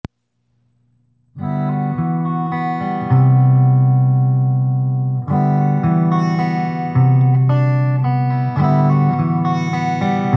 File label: Relaxing slow light rock